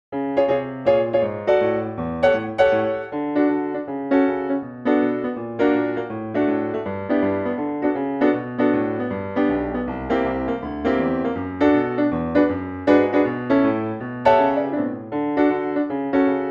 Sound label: Jingle Bells Accompaniment Only